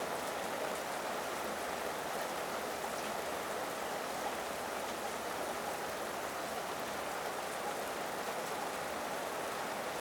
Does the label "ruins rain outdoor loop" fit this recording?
Yes